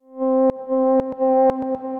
Grand Piano Reverse; Ableton; Factory Packs; Grand Piano Hybrid

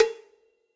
Cowbell Big Warm And Low; Drum Hits; Ableton; Factory Packs; Session Drums Club; Standard Hits

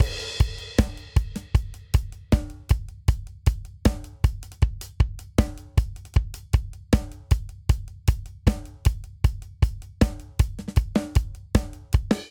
Straight Reggae Chorus 78 bpm; 4 4; MIDI Clips; Ableton; Factory Packs; Drum Booth

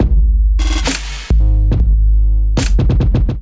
Kit groove 152 bpm; MIDI Clips; Dreams Kit; Ableton; Factory Packs; Beat Tools

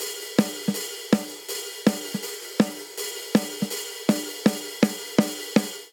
Punk Rock Snare & Hihat 162 bpm; 4 4; MIDI Clips; Ableton; Factory Packs; Drum Booth

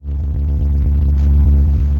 Atmosphere, Ambient & Evolving; Toxic Atmosphere; Ableton; Glitch and Wash

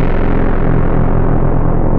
Rumble; Inspired by Nature by Dillon Bastan; Vector FM Presets

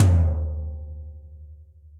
Tom Floor BigPunchy; drum hits; Ableton; Factory Packs; Session Drums Club; Standard Hits

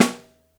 Snare Mono; Ableton Foundation FreePacks; Snare Designer

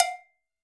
Cowbell A; Latin Percussion; Drum Hits; Ableton; Factory Packs

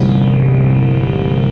Opinionated Bass, Wavetable; Instruments; Ableton; Synth Essentials

